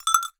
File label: Cowbell Chain, Percussion